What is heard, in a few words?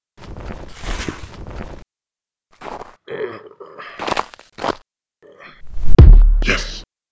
Gift kick; Talking Santa; Android